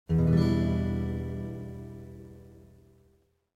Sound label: e min7 good